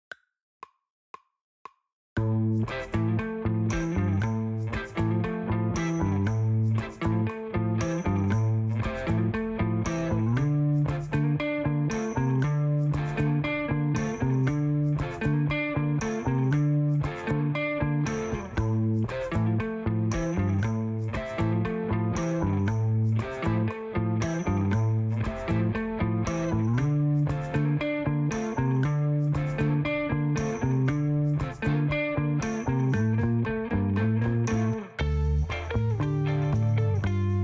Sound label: drumless